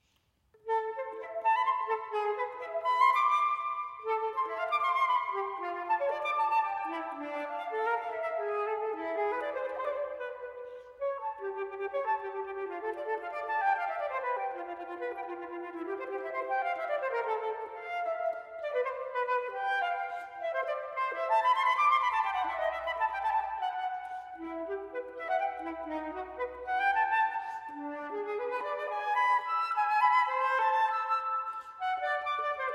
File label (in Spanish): flautista